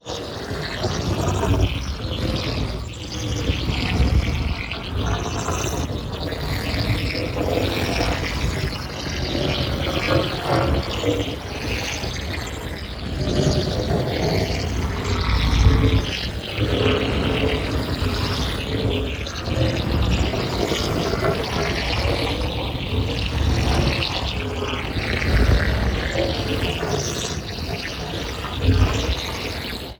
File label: PARTICLES LARGE SLOW; NEON; What's Left From Krypton; boom library